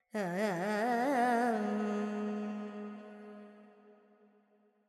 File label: FX Dorian, Vocals, Carnatic Female, Alaps, 70 G